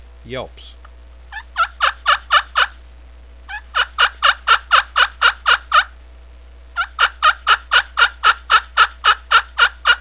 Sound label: glass yelps, turkey hunting